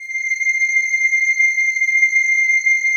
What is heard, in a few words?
PAD, DIGI-PADS